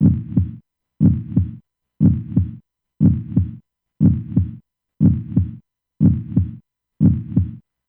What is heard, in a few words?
HeartBeat long slow